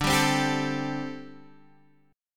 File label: D 7th Suspended 4th, down, Guitar, Half Step Down